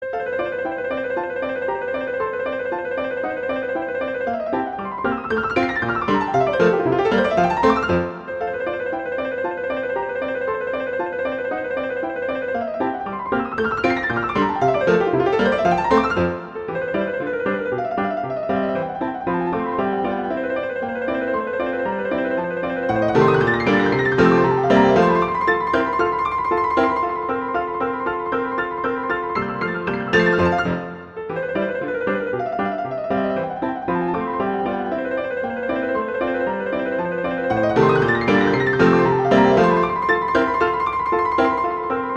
Study 14 - Molto vivo e velocissimo, Czerny Op 299